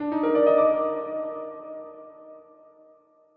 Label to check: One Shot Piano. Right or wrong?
Right